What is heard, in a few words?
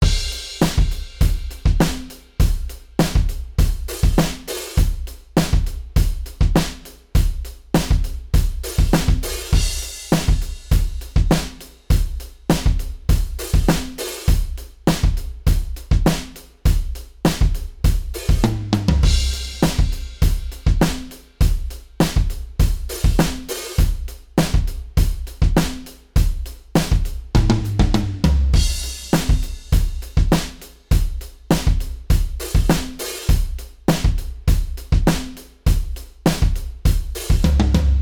modal surf, drums